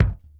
CHILL KICK; AMG Gota Yashiki Groove Activator

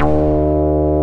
SYNTH LAYER; InVision Interactive; Keith Emerson; The Most Dangerous Synth and Organ

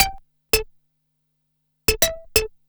SYNT, funk

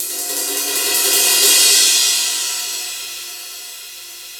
CYM CR ROL, cymbals; Roland LA; CYMBALS TAMS

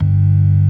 ORG. G1; B3 FULL

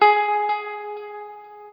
NOODLE LONG, Guitar & Bass

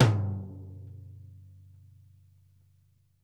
RACK DRY, Drumworks; Sampleheads; New York City Drumworks VOL-1

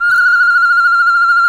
BIRD, bird call; Greytsounds VOL-1; BIRD CALL FX

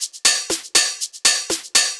Combo Hat

